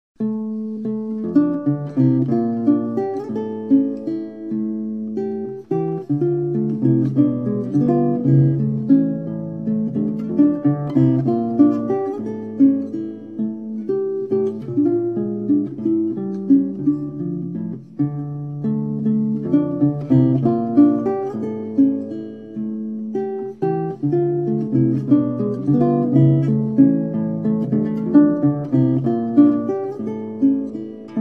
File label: Venezuelan music